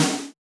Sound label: Snare, trap